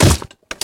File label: step run